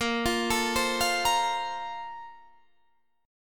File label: A sharp Major 7th Suspended 2nd